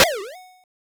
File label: pop; sonic-radiance